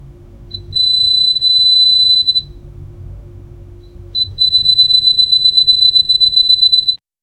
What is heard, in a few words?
repairing bike silenecer, repair